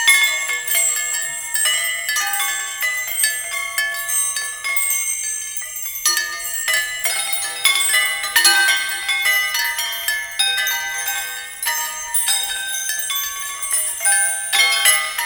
Palanquin Carriage Loop; Fallen From Heaven

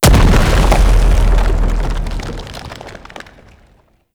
Golem Emerge; Warframe; Derelict

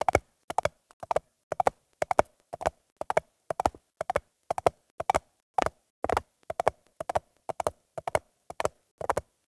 Horse Steps Gallop Loop Concrete; Warframe; Duviri Horse; Vehicles

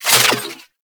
Hack Interaction In; Parazon

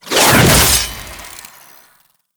Kuva Lich Finisher Parazon Stab In; Warframe

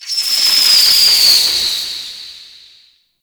Hazard Spark Projectile; Warframe; NineteenNinetyNine; Stadium

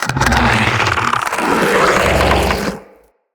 Root Spider Mother Vocal Chatter; Animals; Spiders; Vocals

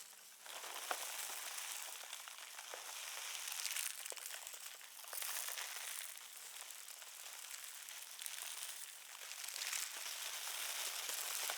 Banglenut Far Loop

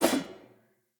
Polearm Whoosh; Weapons; Player; Sphere

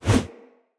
Player Torch Whoosh; Weapons; Blunt

